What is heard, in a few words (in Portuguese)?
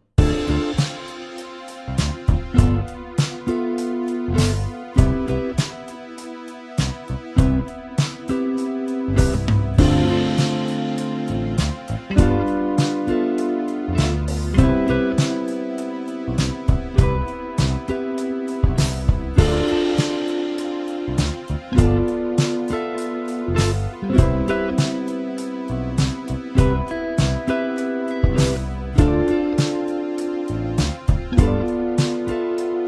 Progressao ii V I